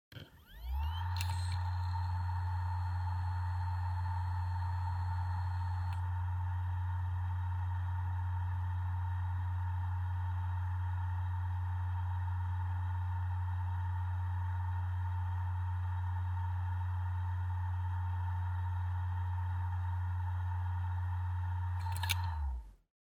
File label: Hard Disk Drive (Operating Noise)